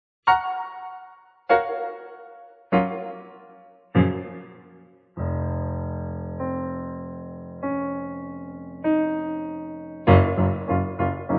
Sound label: Reverb; Wurr Audio